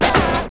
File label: NOISE FX; Zero-G; Funky Elements